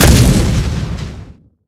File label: fire incinerate; skyrim